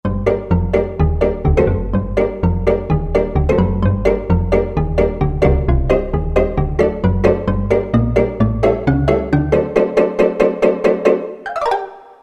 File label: Pizzimba, Roland JD-990; Factory Patches